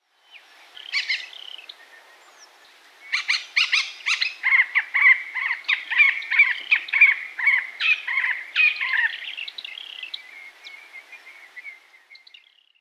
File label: Hall's babbler